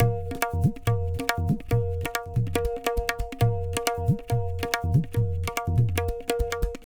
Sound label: Tabla, 140 BPM; Zero-G; Pure Tabla